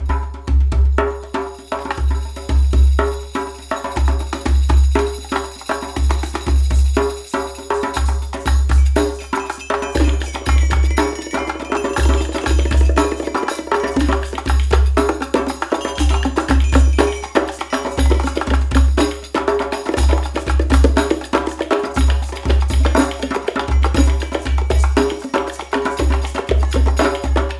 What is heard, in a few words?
Combat loop; raji an ancient epic